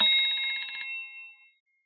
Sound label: timer stop; zhmi-na-lyagushku